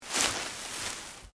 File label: Leaves Move Medium; Material components for constructing more complex sounds; Rustling; Objects